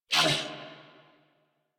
Pain Grineer Male; New War; Narmer

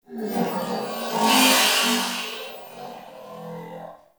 Digest Interior Small, Ambience

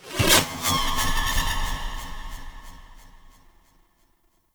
Drop Spawn; Enemies; Sentient; Sentient Movement

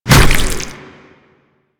Tentacle Swing Hit; Enemies; Abilities

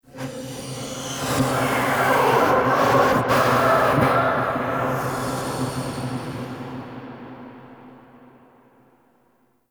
Misc Whispers Large; Warframe; Ambience; Entrati

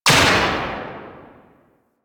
Archwing Mach Gun Tail; Warframe; Weapons

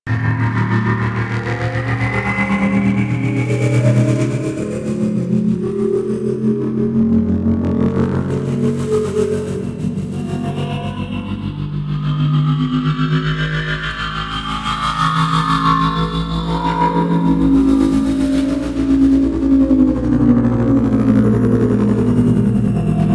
Nimble Ship Rotation Loop; Warframe; DE; Vehicles; RailJack; RailJackShip; AlternateEngines

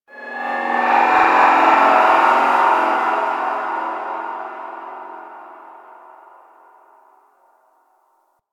Guild Mid Texture; Ambience; Warframe

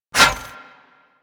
Disarm Dart Shot; Warframe; Pacifist

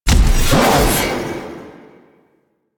Backstab Self Impact; Warframe; Warframes; Pax Duviricus

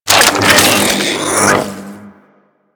Infested Arc Rifle Reload A; Warframe; Weapons

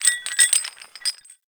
Swing Chain Move; Ambience; Dungeons; Under City

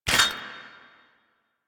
Footstep Default; Warframe; Warframes; Hoplite

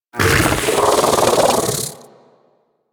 bug vocal mount; Warframe; Hunting Critters; Inf K Drive Bug

